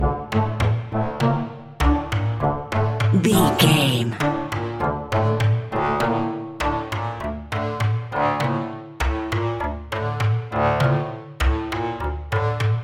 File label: strange intro